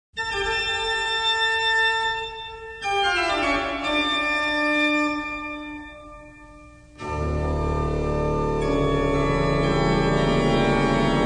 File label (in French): orgue